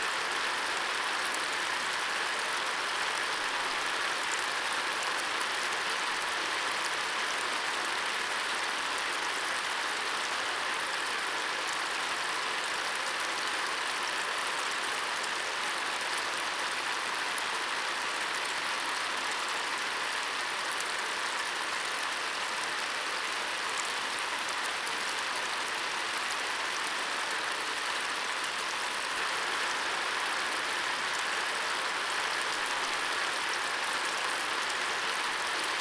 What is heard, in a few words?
Rain Sounds Nature Sounds Sprinkling